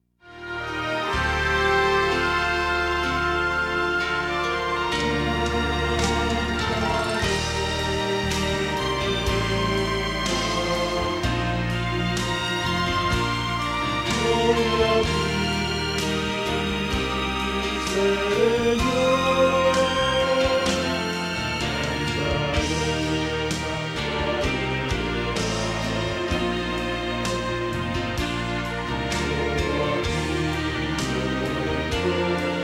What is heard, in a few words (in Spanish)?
Bajo